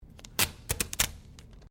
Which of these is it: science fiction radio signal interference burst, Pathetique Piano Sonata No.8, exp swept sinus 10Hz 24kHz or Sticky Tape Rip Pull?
Sticky Tape Rip Pull